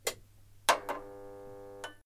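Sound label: Light Turn On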